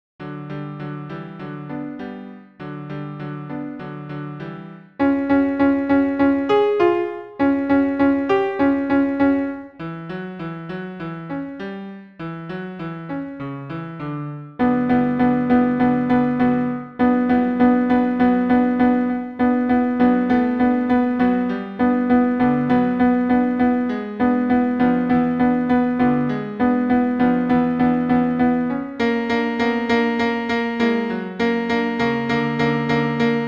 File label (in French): tango alt pian acc